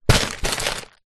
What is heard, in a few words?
leisure lego box full place down on floor; zapsplat